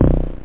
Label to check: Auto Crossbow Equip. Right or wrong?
Wrong